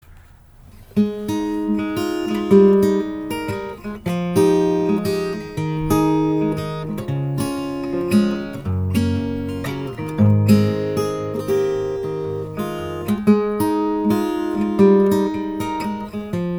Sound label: guitar instrumental